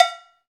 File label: COWB, Cow Bells, Roland